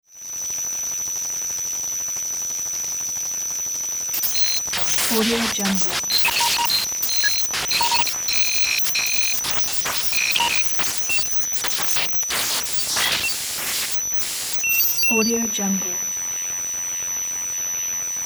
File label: short encrypted alien message